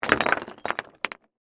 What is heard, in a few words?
Crash, Rock, Debris, Small, Dump